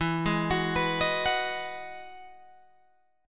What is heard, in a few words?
E Major 9th E down Guitar Open G